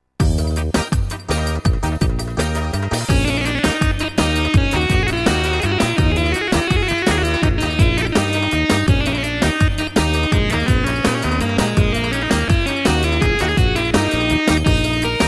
ciftetelli

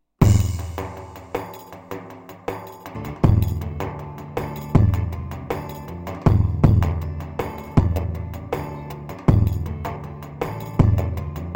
pa 500 tr cokertme, korg karisik ritimler

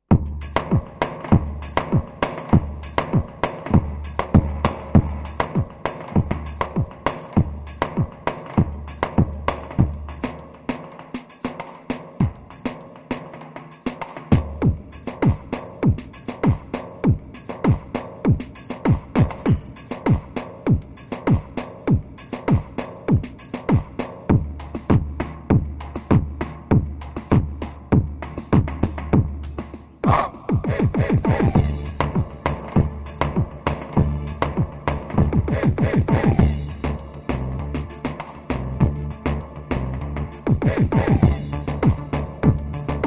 pa 500 tr tekno, korg; tr karisik ritimler

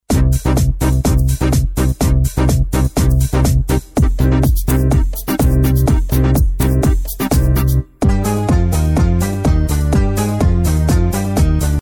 gw8 rtim ve sound

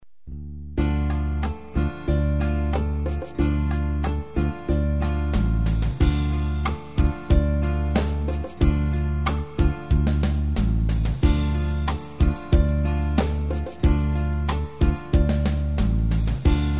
taverna slow; ketron sx3000

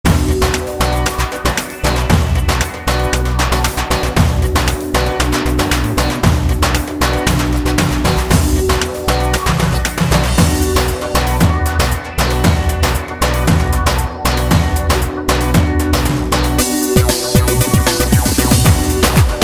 6-8 davul halay; Roland; OmeXr Yeni Ritim Demosu (2-4Halay)

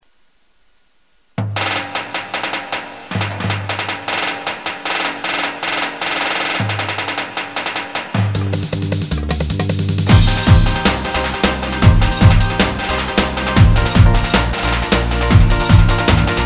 romanim, gw-8

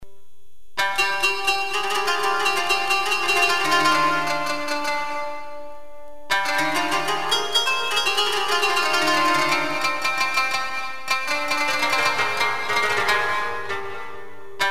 kanun mandal

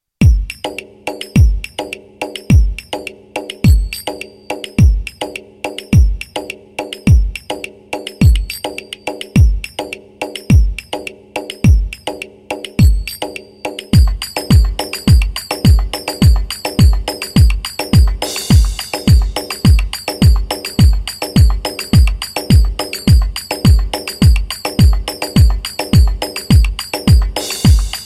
xd9 ritim, 2-4 ritim

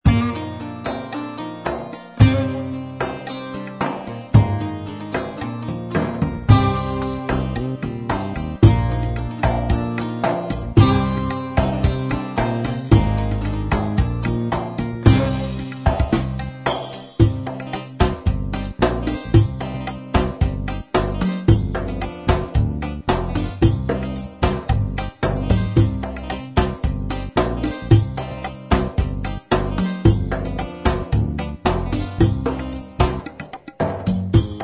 style agirlama, oriental; gem genesys pro oriental

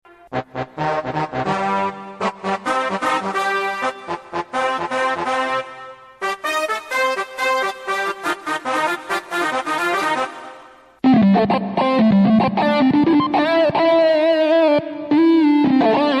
roland gw8 sesler deneme; bati ses

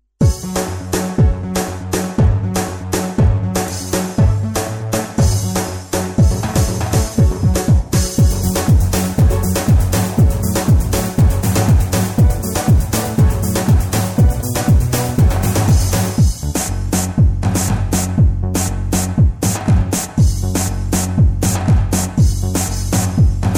halay gw8, roland

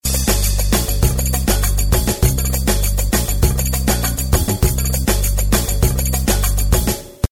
kesanli ritim denemeleri, gw8 ritim

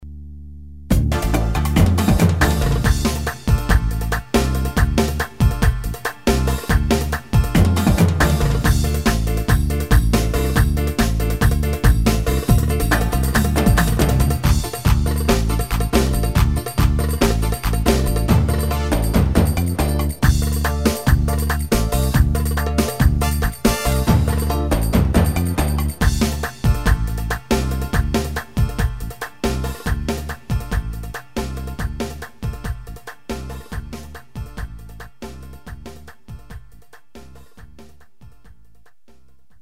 roman; ketron sx3000

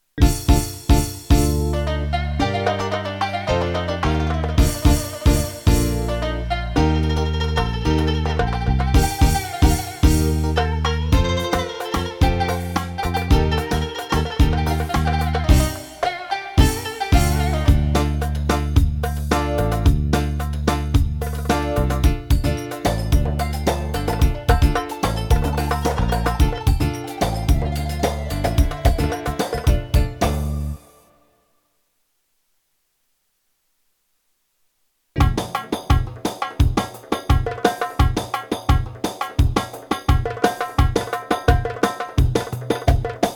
Ketron XD9 ritim

